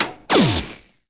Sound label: drop mine; wob2 demo